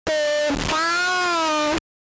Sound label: Pikachu SFX; RBY